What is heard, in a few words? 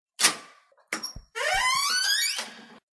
door creaking